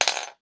item misc; RPG

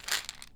Vitamin D Roll, Pill Bottles

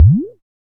Blob Richochet; FREE SFX PACK VOL 1

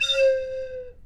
Quick Low Squeak, Slide Whistle

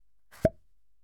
Spray packaging Pop Sound

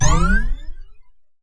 Retro Charge Stereo UP

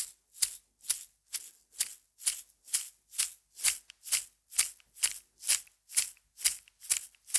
130bpm Small Medicine Bottle Shaker with ring

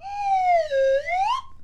Slow Fall Rise, Slide Whistle

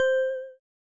Retro Instrument crystal C04; Instruments

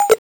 Coin Pickup; FREE SFX PACK VOL 1

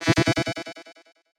Delay Allert; FREE SFX PACK VOL 3

